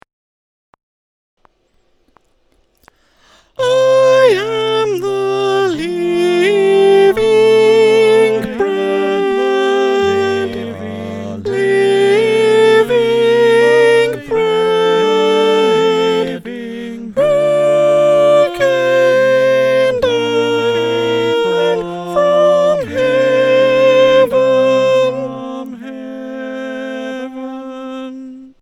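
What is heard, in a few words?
Soprano click track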